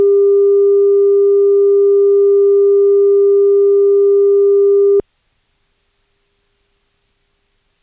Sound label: 315Hz